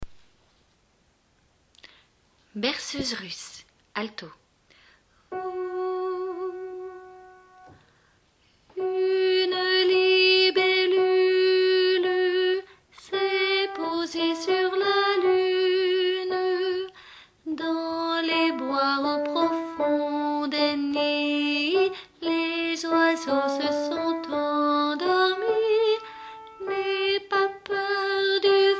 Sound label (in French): berceuse russe alto